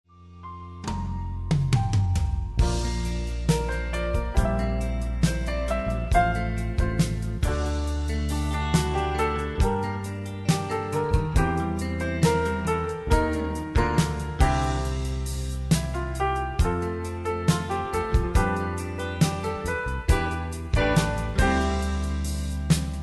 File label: acoustic piano